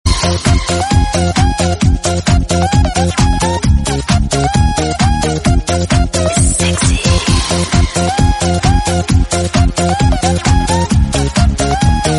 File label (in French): sonnerie electro francaise